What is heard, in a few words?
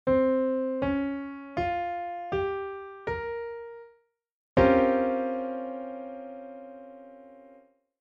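chord piano sus b9 phrygian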